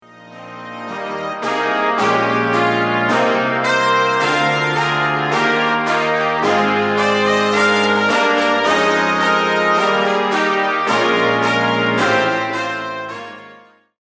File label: brass